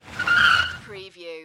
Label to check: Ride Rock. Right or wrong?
Wrong